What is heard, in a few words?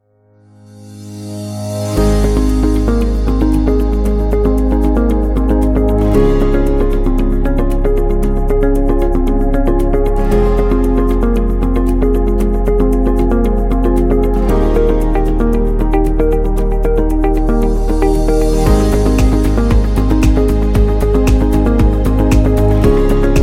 Real Estate Corporate